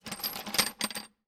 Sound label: Metal Bolts; 99Sounds; The Warehouse